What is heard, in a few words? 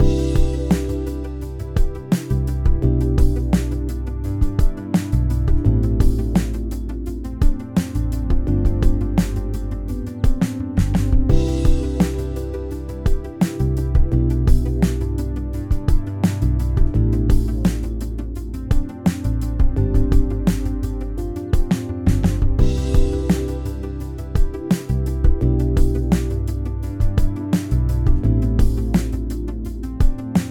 Lofi